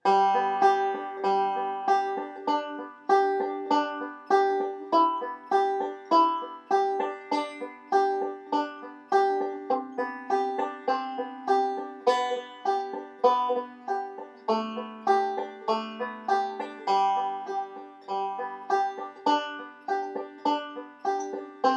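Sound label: twinkle rolling, banjo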